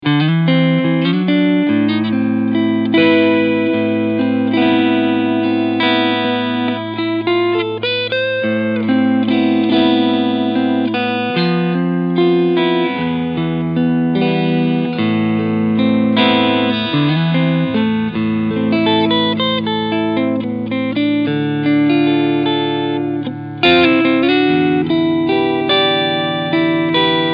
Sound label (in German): Cleansound Halspickup